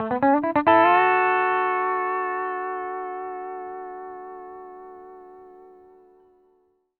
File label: LOOPS 140, Country